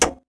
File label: button